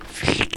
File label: shit packing; poop; ShittingSimulator